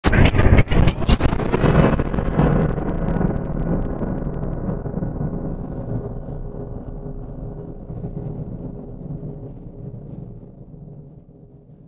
static silence from beyond, Cinematic Metal Impacts